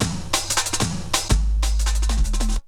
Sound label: Essential beats